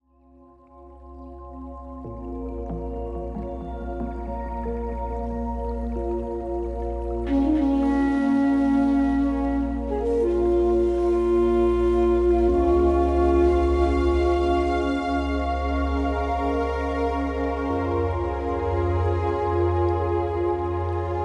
Relaxing Music for Stress Relief. Calm Music for Meditatation Yoga Reiki Zen Spa